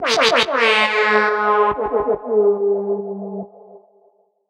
FX Downlifter; Sample Banks; Percussion

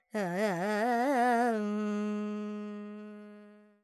Dorian, Vocals Carnatic Female Alaps 70 G Dry; Sample Banks

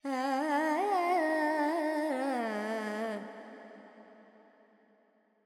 FX Purvi Kalyani, Carnatic Female Alaps; Sample Banks

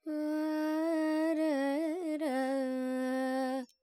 Charukesi, Vocals, Carnatic Female, Alaps, G, Dry

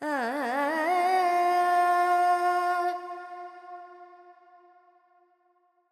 FX Dorian, Vocals, Carnatic Female, Alaps, 70 G

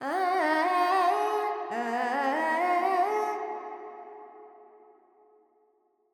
Verb Dorian, Vocals, Carnatic Female, Alaps, 70 G

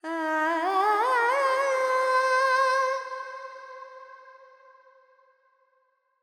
FX, Vocals, Carnatic Female, Alaps, Charukesi

